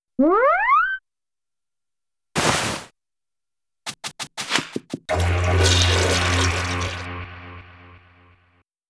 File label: SE Epic Battle; Calamity Compilaton